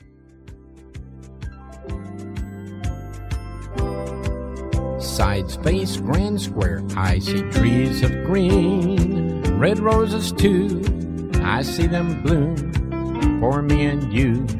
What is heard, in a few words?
vocal